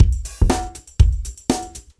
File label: Breakbeats loop, 70_s Breakbeats